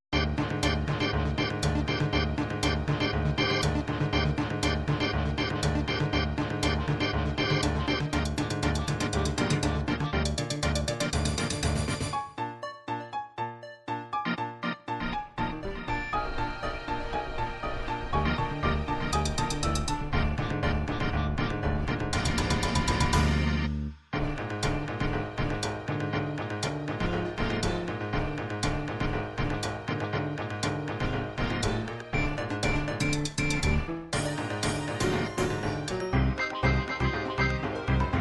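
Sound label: dance techno pop, midi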